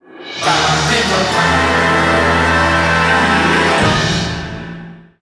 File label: Music - Lose; Crash Tag Team Racing